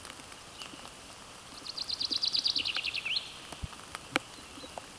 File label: Fringilla coelebs